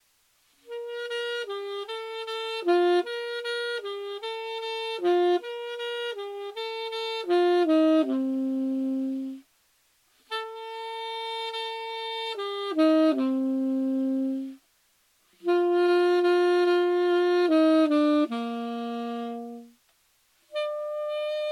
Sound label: tenor cielito